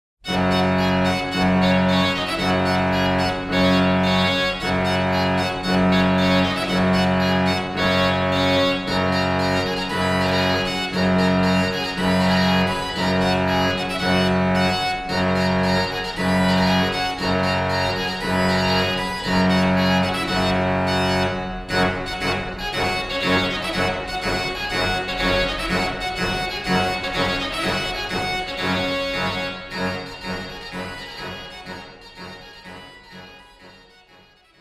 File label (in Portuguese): Orgao Arouca; PureSound